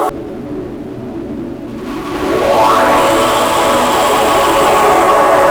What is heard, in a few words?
pads wet; folda; sentinel av